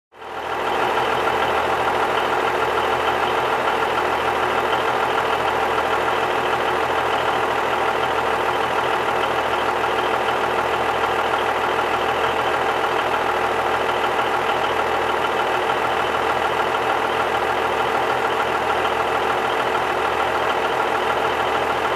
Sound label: Bulldozer on Idle